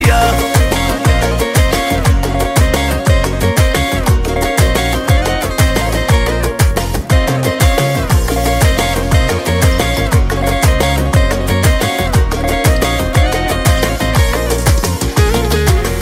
armenian dance

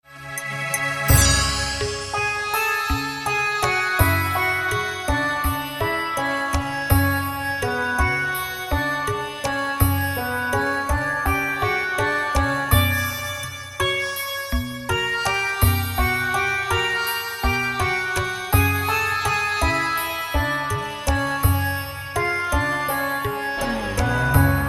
indian drummer

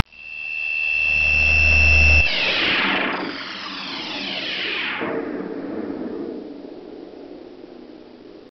Boss Death

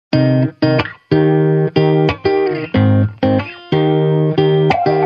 ring short

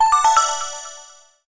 Text Tone

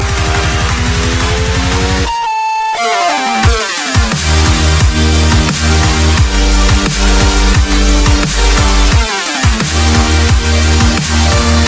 Chillstep

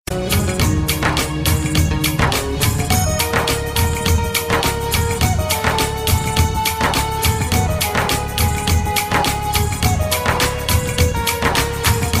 Dhol

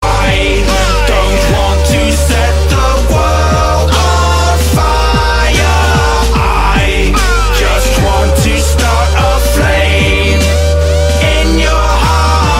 Rap; Fallout 76

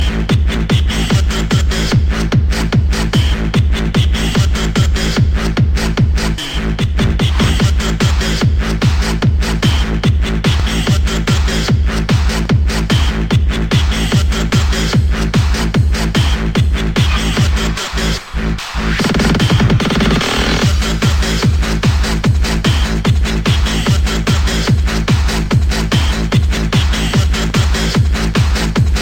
Hardstyle

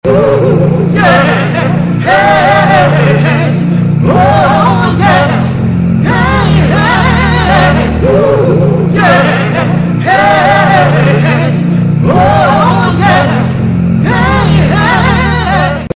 Oh yeah song

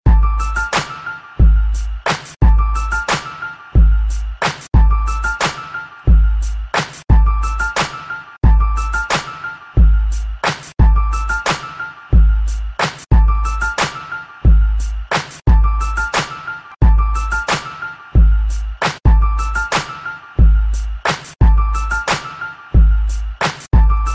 Cool Beat

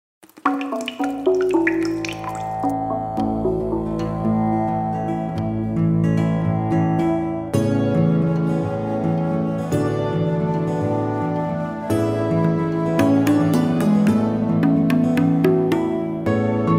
Theme Song

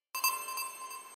New Objective; BOTW

